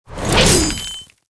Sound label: core attack